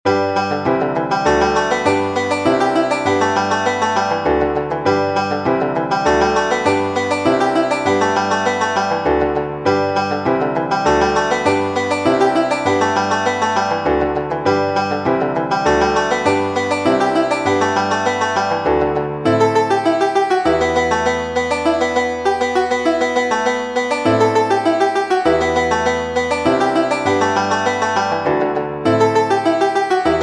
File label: reel, Irish banjo; James MacMahon; The Banshee